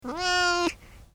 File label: Miaow; Still not content; Kitti